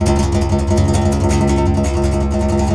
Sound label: C. Bass Balalaika, Plucked Strings